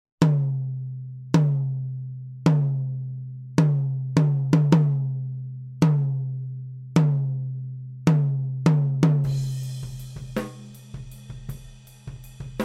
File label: Tom Microphone Clarion